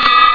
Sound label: bell